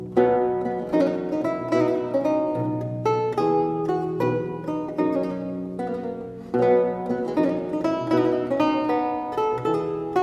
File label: A country dance, Lute; Jane Pickeringes Lute Book